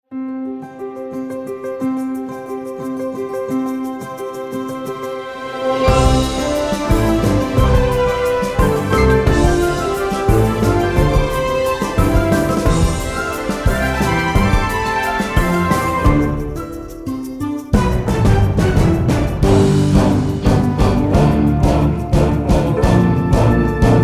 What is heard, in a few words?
Comedy Titles, Comedy Music